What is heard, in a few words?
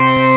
church